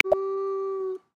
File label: Wolf; Xiaomi Redmi-Note-8-Pro; South West Mountain